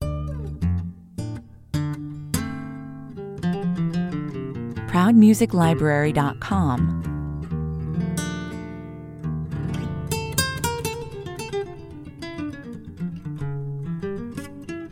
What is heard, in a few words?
acoustic love story